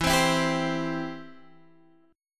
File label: E Minor Sharp 5th; Em#5 strummed; x,7,10,9,8,8; JGuitar